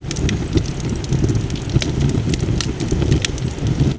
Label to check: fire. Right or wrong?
Right